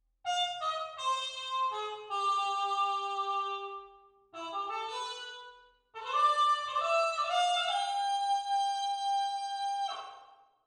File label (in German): Trompete DVR Solo